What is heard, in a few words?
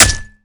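fireaxe hit body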